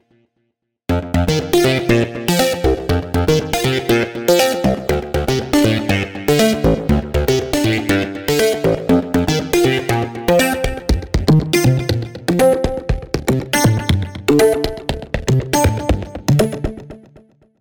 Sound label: Waves CODEX Onion Groove